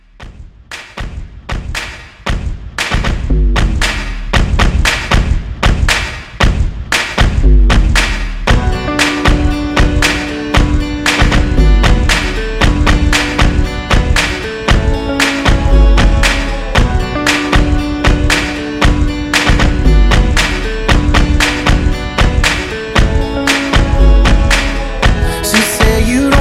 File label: Re Drum, 116